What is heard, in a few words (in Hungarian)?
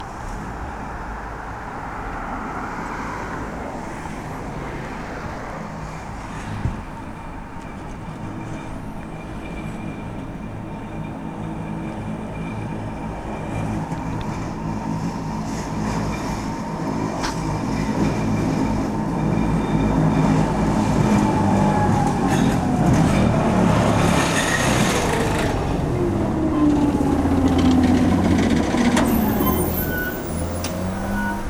fogaskereku; olympus ls10es diktafon